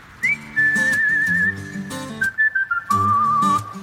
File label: Ah Aah; Kili Poyi